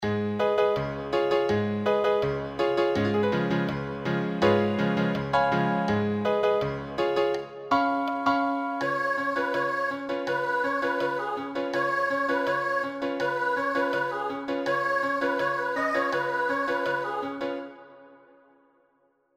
accompaniment

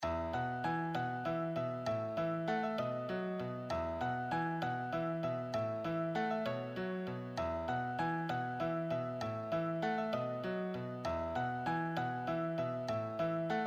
solo, piano

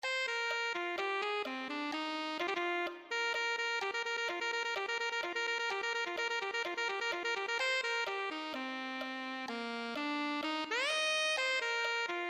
alto saxophone

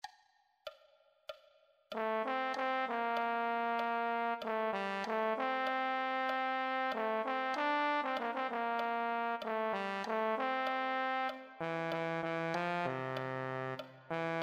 euphonium

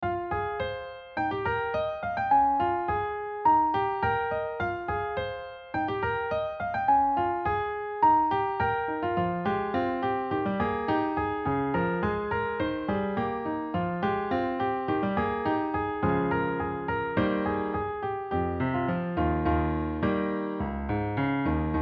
Remix solo, piano

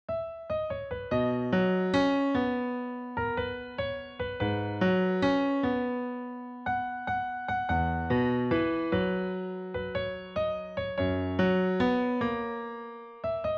solo, piano; Kabir Singh

